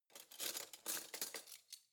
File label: cutlery spoons drawer grab soft long